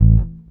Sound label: Basses, A; Total Drum&Bass